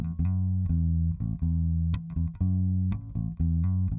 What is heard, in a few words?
bass; musdb18